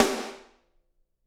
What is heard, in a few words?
SD, Drums; Bob Clearmountain Drums I